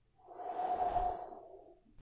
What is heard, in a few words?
Light Slow Swoosh